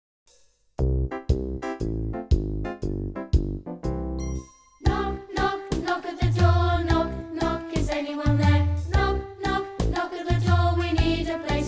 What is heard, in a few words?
Knock knock choir